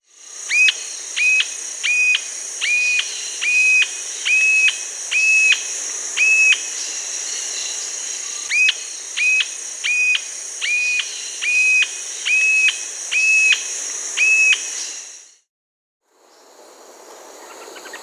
Bluish-fronted Jacamar (Galbula cyanescens), Peru, Birds of the Amazon; S. America